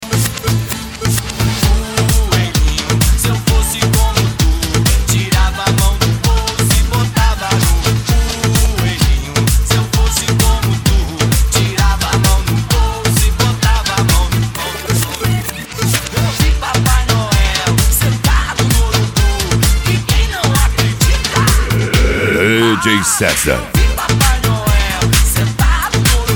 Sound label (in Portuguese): sound car carnaval